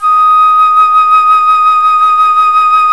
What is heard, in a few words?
FLUTE, Flt vib; Roland L-CDX-03 Disk 1